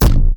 glitch; foxdot default

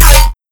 Kick Dist

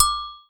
Perc Agogo High; Forzee

